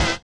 collision; Spectrasonics; Metamorphosis

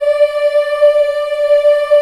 D4 CHI IH, CHILD, Choir; CHILD IHP

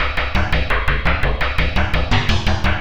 synth arp; Electrocution

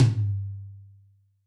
Yamaha DJX 2B, Rock Tom

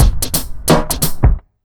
88 BEAT, Pure Electro, BEAT MIX; USB Soundscan vol.03; AKAI

